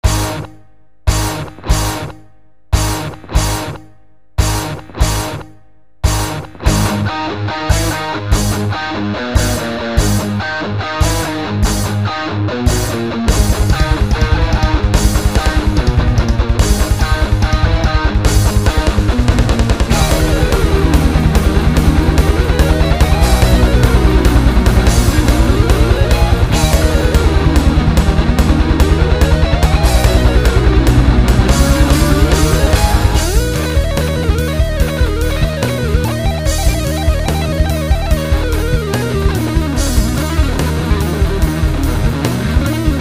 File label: minor harmonic